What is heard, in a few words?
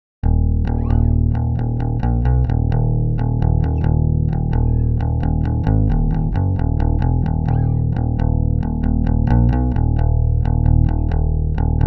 Electric Bass Unprocessed